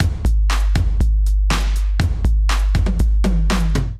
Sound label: Kit groove 120bpm, Drum Loops; Neon Midnight; Ableton; Singularities